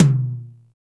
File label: TRUETOM HI; Drums In Your FACE!